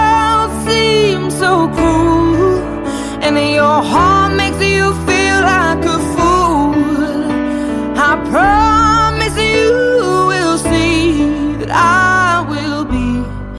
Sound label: english ringtone